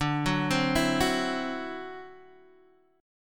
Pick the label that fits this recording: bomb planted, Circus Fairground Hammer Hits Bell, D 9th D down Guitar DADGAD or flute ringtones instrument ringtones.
D 9th D down Guitar DADGAD